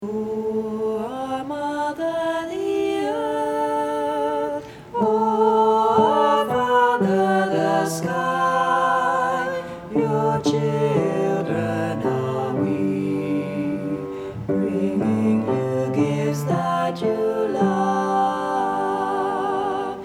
all voices

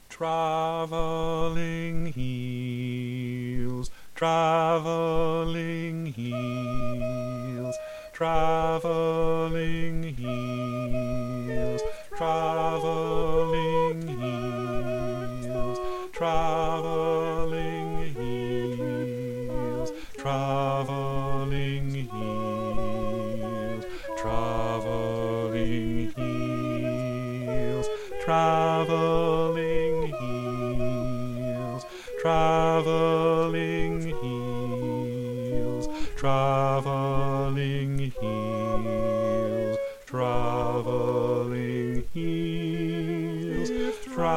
bass

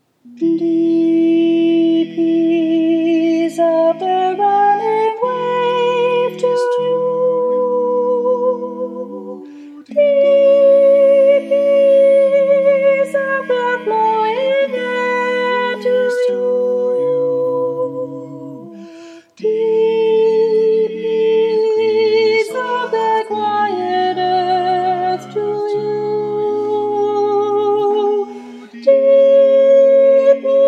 Soprano

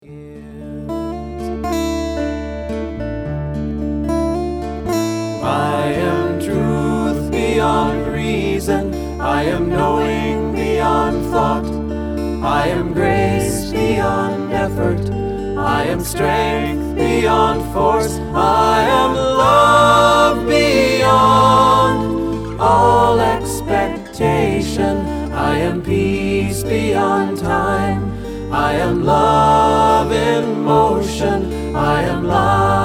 chorus All; 2023 Fall Repertoire

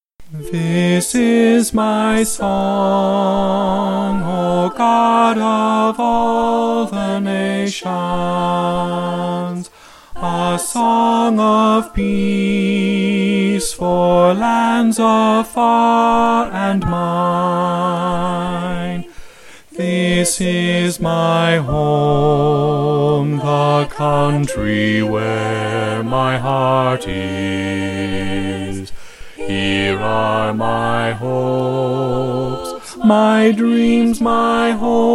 Song of Peace bass

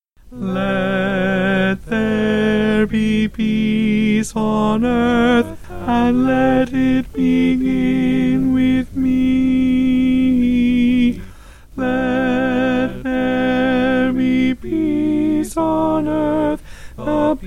alto

